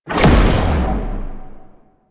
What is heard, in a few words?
Cloak Cast; Warframe; Warframes; Frumentarius